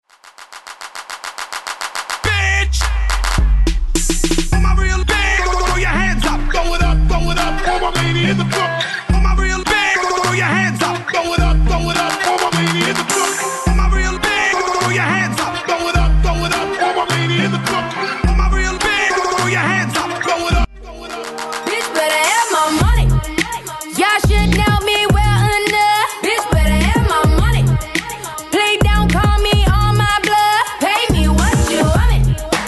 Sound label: Clap, 105 BPM, Key C min; Mashup